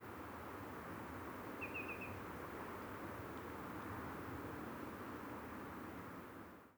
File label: nfc faster